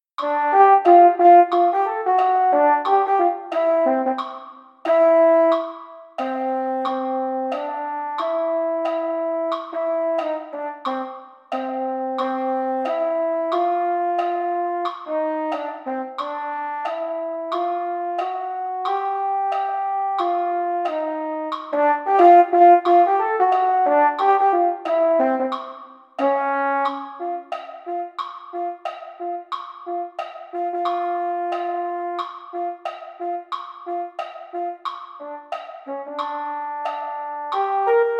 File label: mellophones; holiday brass